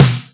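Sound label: snare, Boss DR-202; Drum Machines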